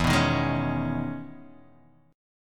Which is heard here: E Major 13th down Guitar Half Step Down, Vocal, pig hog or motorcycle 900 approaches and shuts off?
E Major 13th down Guitar Half Step Down